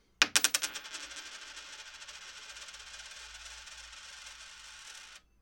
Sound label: Small Coin Drop